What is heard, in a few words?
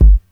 BD, Dance Mega Drums, HIP